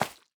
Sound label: add candle, cake; minecraft; block